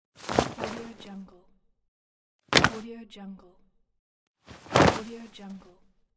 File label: bag dropping